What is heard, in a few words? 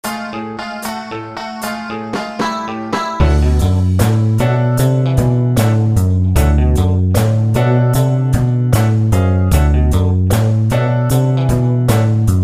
12 Bar Blues boogie woogie bass line in D; Chord Progressions